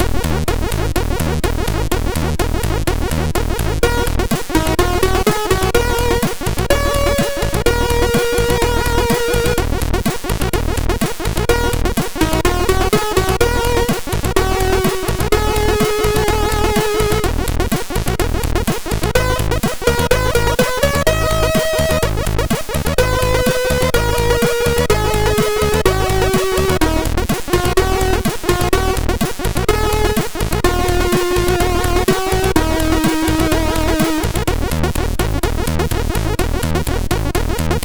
Spring Break, MOS6581R2

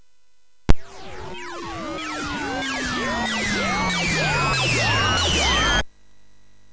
Music Demo Version, MOS6581R3; hvsc

